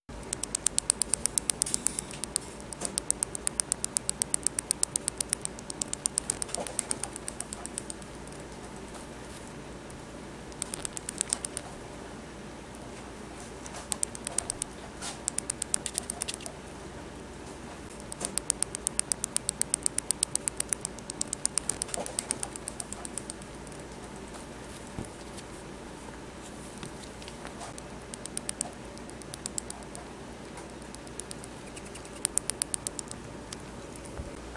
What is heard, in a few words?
clicking, sugar glider